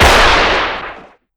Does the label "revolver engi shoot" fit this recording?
Yes